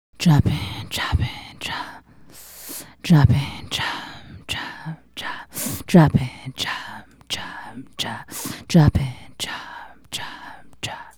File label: Female Solo, Hip-Hop and RnB Vocals